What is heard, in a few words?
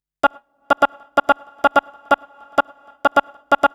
House Loops, Vocal Loops House 128BPM